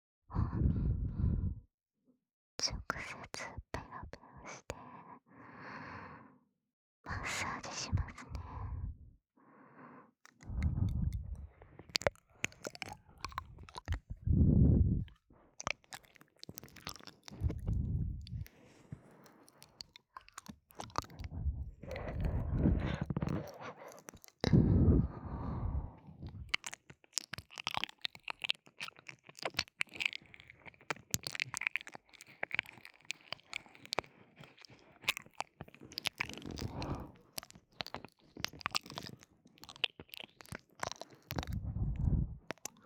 左 直接舔耳; 白耳; 舔耳按摩

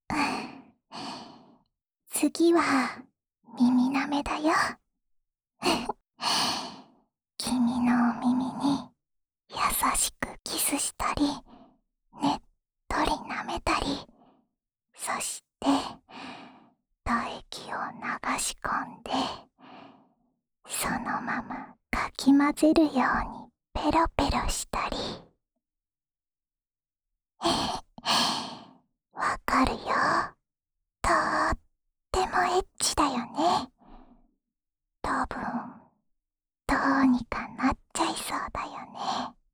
深深的舔耳 左; 瑠佳深深的舔耳 左; ASMR版

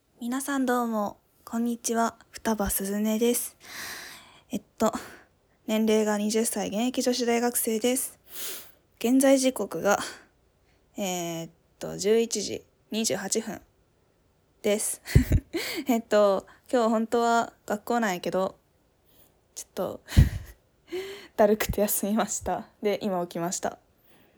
尿进纸杯子里

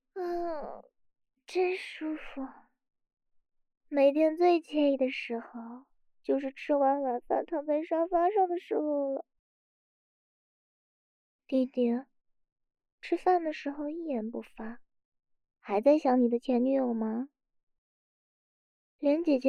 姐姐的舔耳安慰（舔耳，ASMR）; 无音效

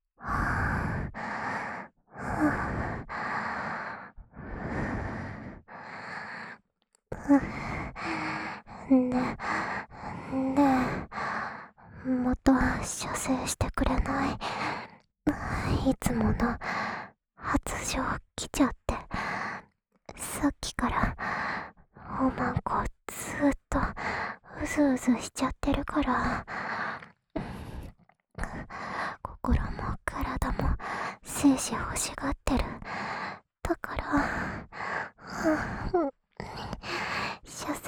辅助自慰用 饱含唾液的舔耳循环 左耳

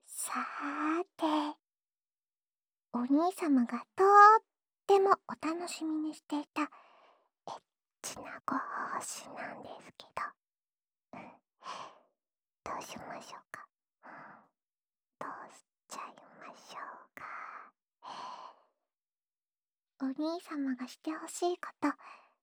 要不要感受看看我的嘴呢？（濃厚口交）

